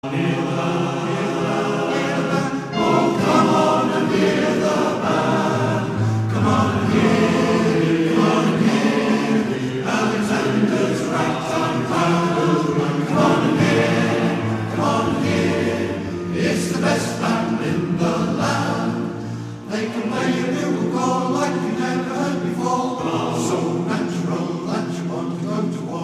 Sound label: Ragtime Band